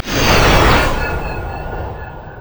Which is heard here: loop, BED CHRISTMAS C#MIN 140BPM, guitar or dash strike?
dash strike